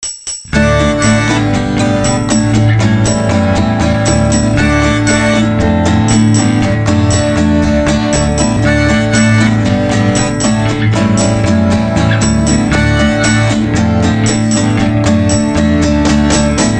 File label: GUITER